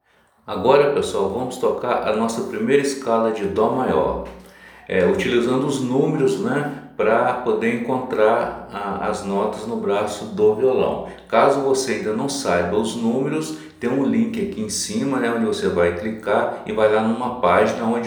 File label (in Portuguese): Escala dó maior